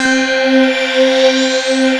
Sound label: ATMO SYNTH